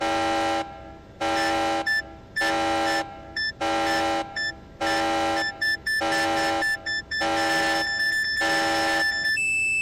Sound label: explosive tanker